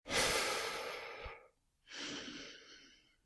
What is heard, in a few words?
Breathe Slow heavy breath; Eden; RadwinIsland